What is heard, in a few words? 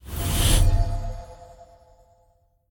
channel outro; smite; tiamat